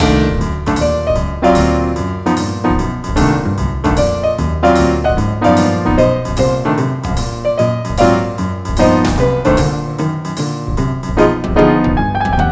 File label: Quik riff, Jazz